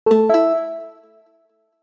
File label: Bluetooth connected headphones success tone; Headphones and Speakers